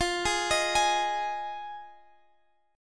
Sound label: F Minor 7th F Guitar Drop D